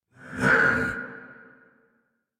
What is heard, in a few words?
Attack Effort; Warframe; Dax Heavy; en